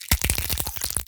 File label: Collisions Bodyfall; Lurker; Evolve; Gorgon